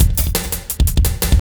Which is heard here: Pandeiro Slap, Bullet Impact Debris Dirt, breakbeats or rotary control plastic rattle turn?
breakbeats